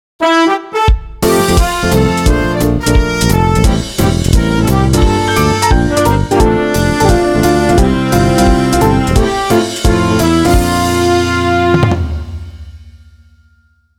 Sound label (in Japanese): JAZZアレンジ; 人魚姫